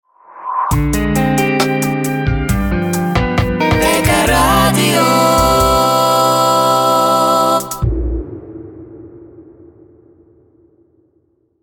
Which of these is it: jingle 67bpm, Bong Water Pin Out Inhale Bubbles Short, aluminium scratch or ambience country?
jingle 67bpm